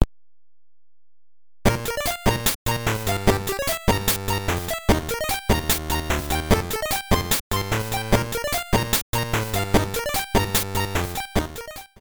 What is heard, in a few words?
Staff Roll; donkey kong; gameboy